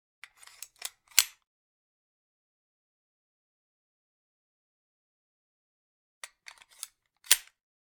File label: 9mm Magazine Insert; Hollywood Edge; Heavily Armed